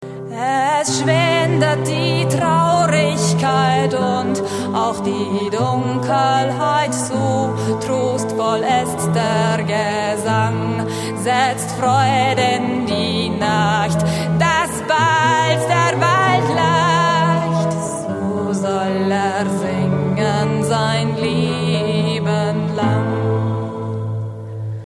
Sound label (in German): rabengesang